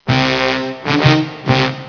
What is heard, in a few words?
Dum Da Dum Dum, Beeps; Bip Boing & autres